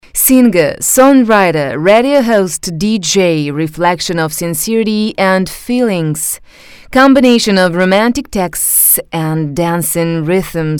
Promo Jingle

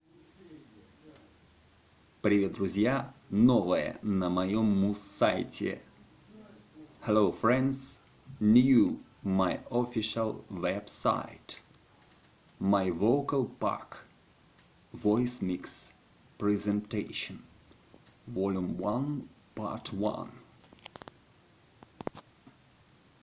Vocal Voice Mix

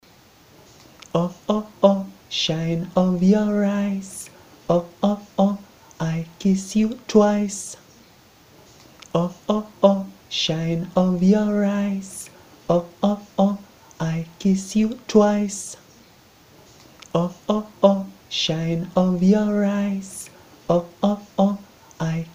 Vocal

